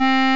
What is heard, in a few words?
clarinet